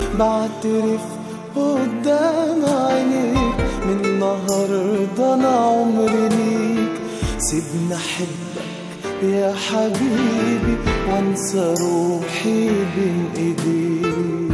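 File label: beautiful arabic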